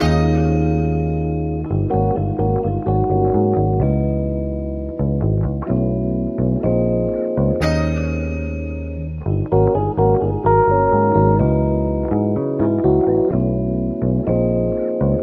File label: keys, phonky loops